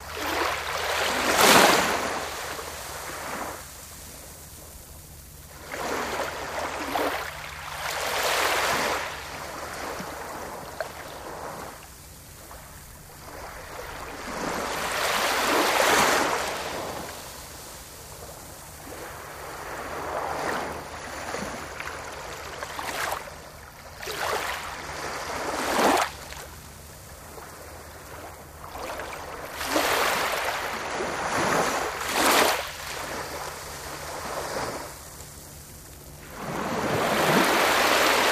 Ocean Water Lap Smoot

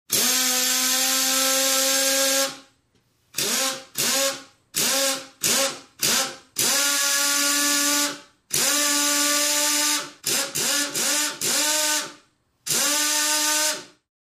Air Tool Wrench Whir

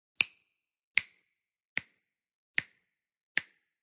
Fingers Beat Time, finger snaps

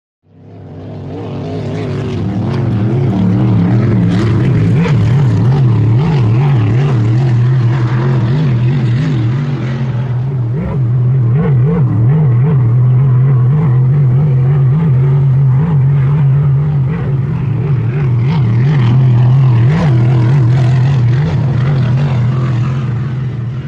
Power Boats By Fast C, off shore power boat

